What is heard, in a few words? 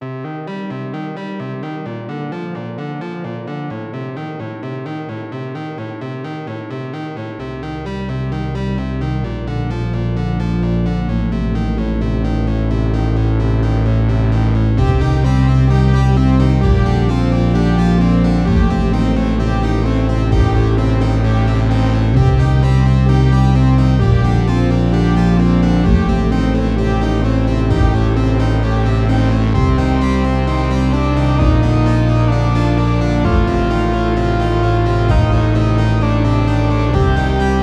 Noise Harmonium